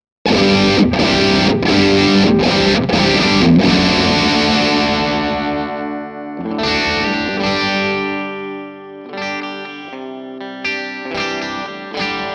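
Dyna Distortion, Dynamic Distortion, chitarra elettrica Kramer; Bridge pickup; Modalità: Distortion; Gain: 5/10; Tone: 5/10; Middle: 5/10; Presence: 5/10; Volume: 5/10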